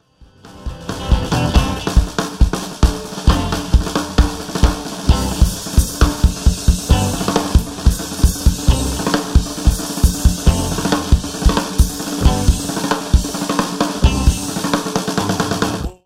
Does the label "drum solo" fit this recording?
Yes